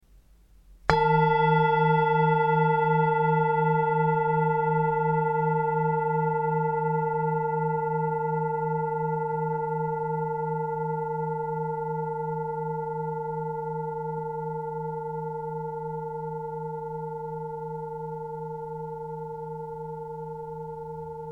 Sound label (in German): Klangschale